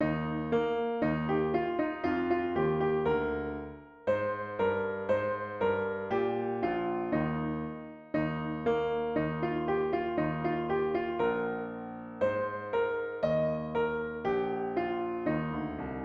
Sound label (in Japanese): ピアノ